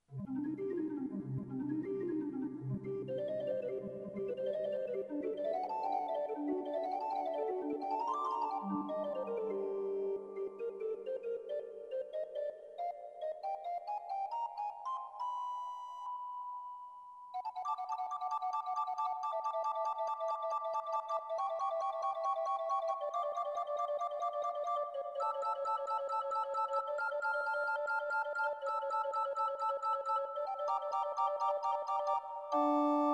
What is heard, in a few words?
Gedackt 8, organ